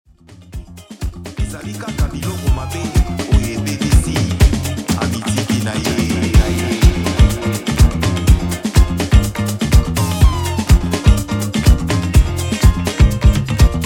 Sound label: Boogie Bounce Dub